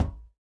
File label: plating, Footsteps